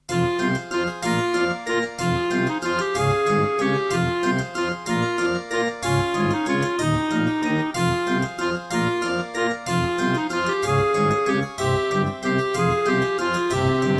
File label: Korg X5DR; Death Gate